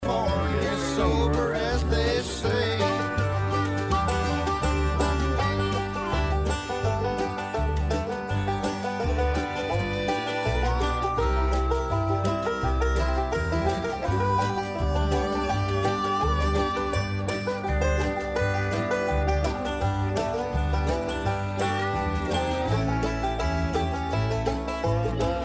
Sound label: slow, banjo